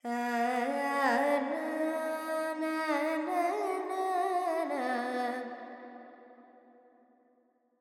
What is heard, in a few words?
Verb Dorian, Vocals, Carnatic Female, Alaps, 70 G